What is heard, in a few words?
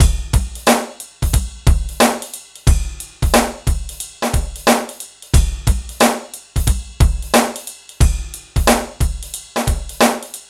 FUNK, Funky Xtreams; INIS